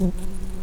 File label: Lapidarius queens, bumblebees